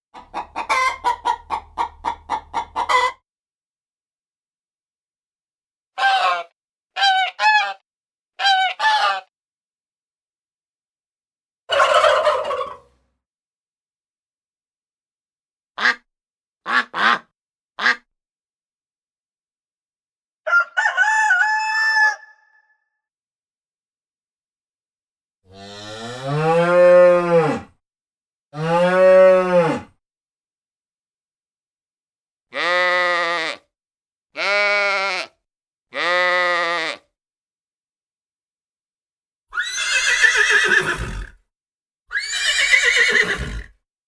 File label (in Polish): Odglosy zwierzat wiejskich Biedronki